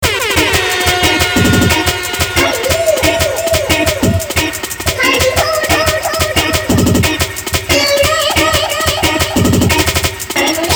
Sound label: jungle